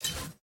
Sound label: map opponent